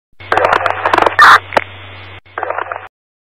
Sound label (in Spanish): radio entrada